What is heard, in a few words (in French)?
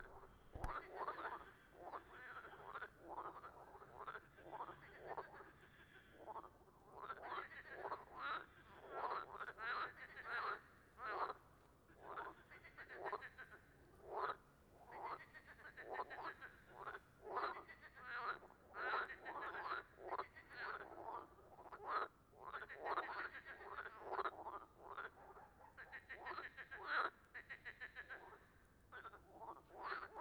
tuilerie chant amphibiens grenouilles